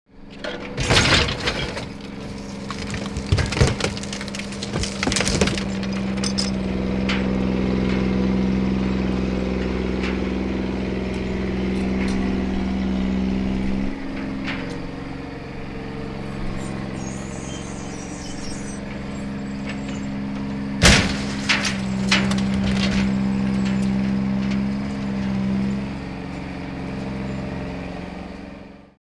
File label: Crane Picking Up Car D